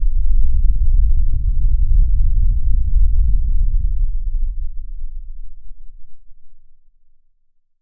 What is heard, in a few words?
ultra minimal time warp; monochrome